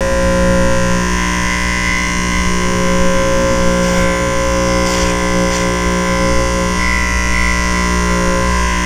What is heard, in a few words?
RAZOR BUZ, Motor; SFX